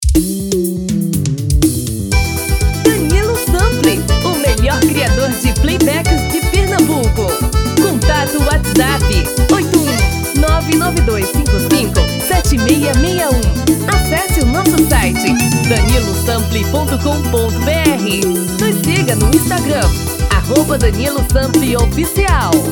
Forro das Antigas

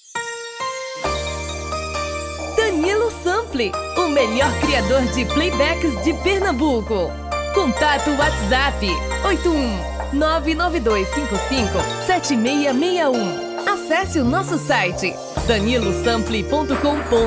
Brega

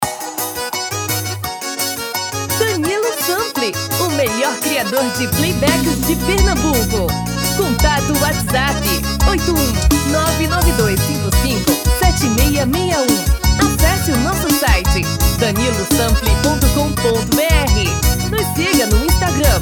Xote tom feminino

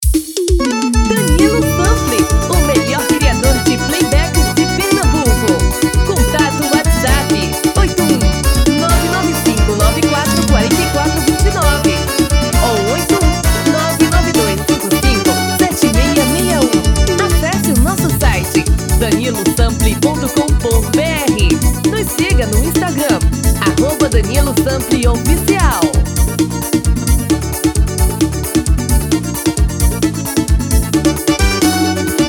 Forrozao

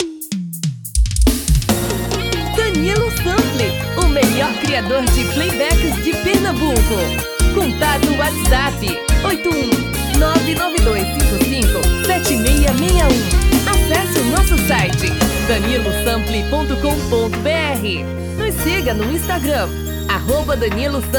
Brega

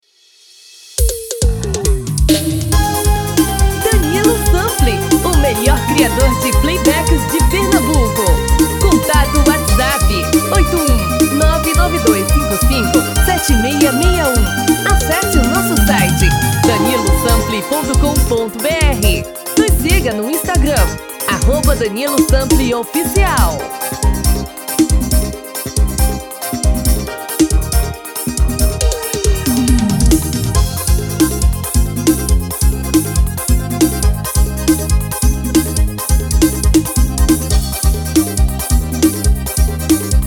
FEMININO

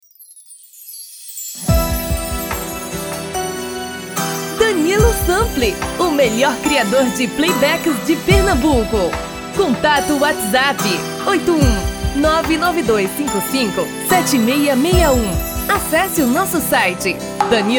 tom masculino

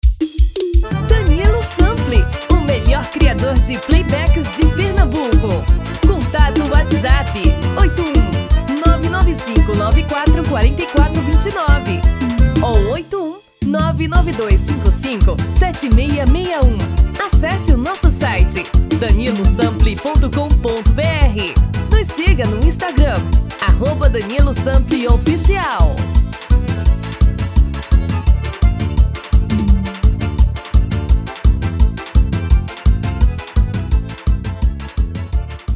Arrasta pe

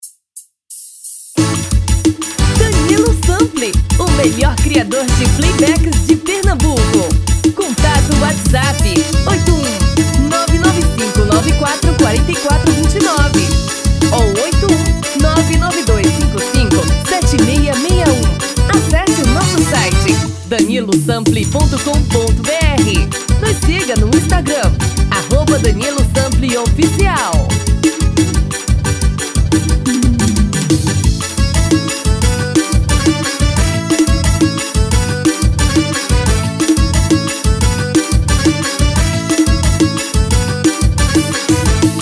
Arrasta pe

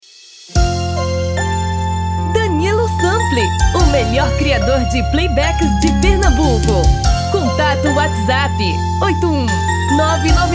Brega

